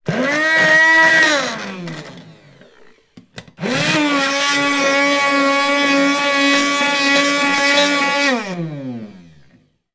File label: blender hand; household